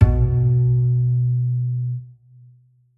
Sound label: Bass Note; Bendy: Lone Wolf